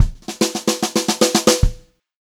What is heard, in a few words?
152 FILL, Brushed Drum Loops; USB Soundscan vol.39; AKAI; HOTROD